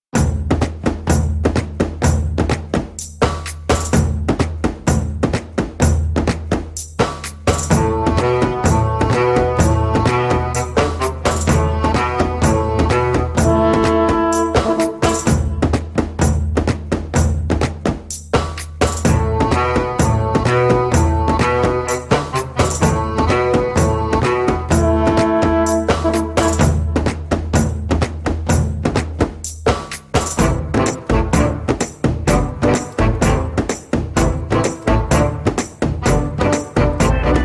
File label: Drum Beats